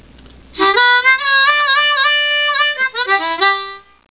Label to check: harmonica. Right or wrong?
Right